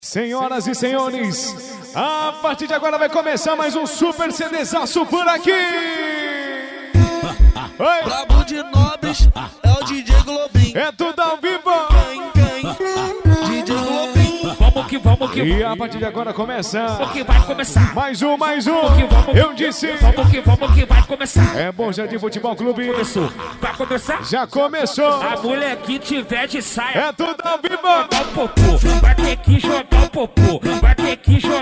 funk pisadinha sertanejo

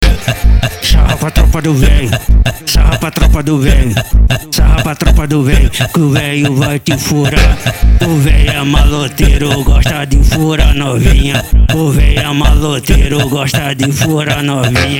funk consciente e funk ritmado

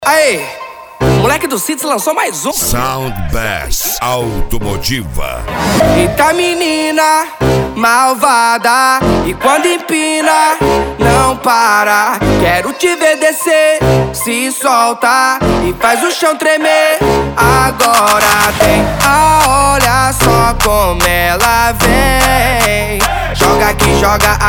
sound bass automotiva; uba mg

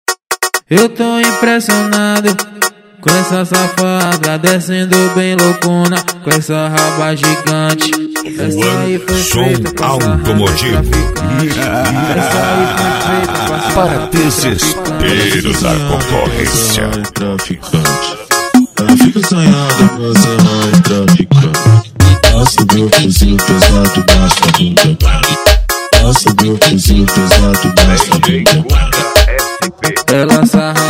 som automotivo; paratinga bahia

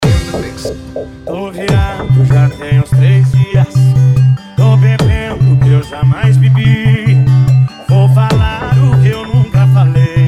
mega electro funk

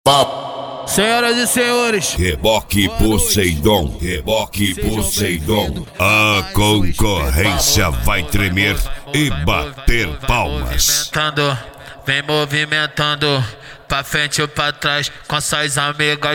sound car; reboque poseidon sartori

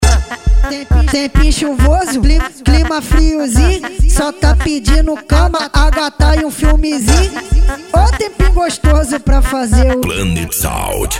funk ritmado; cuiaba mt

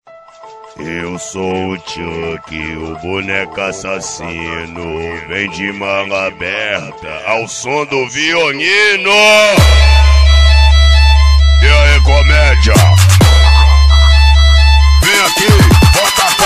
som do violino; etezin sound exclusivo

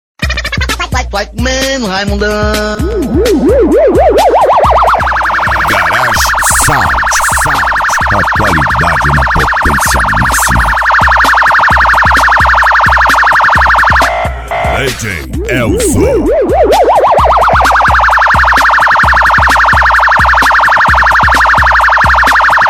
sirene; garage sound so as top

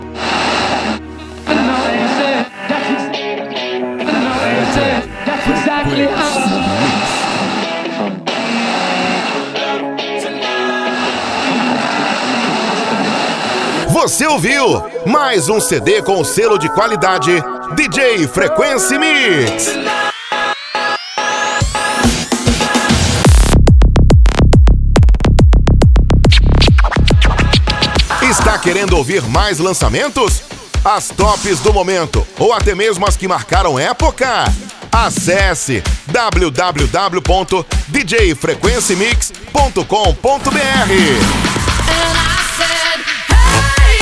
cd caminhao do piseiro esp rap

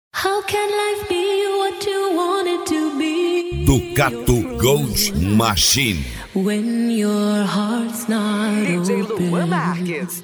especial funk

